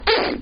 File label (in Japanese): プー・・・, 生活音; 生活音系効果音